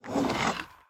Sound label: wither skeleton idle; Minecraft